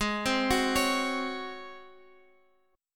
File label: G sharp 11th, Guitar, Half Step Down